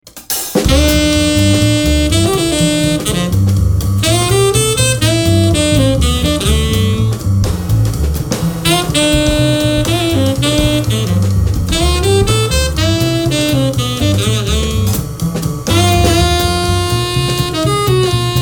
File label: Trio Jazz